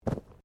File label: Bodymovement Fall Light